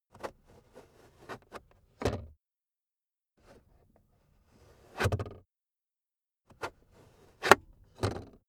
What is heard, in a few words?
car backseat table pull out cupholder slow